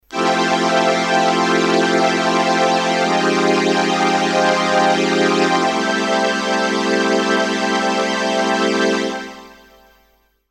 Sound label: strings